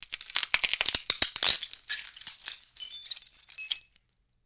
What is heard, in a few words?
Glass Crunch With Tiny Shards